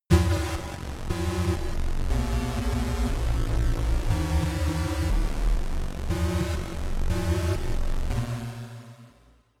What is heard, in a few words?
danger synth, sciene-fiction